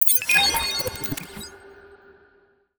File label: asset acquired positive; Stellaris; nemesis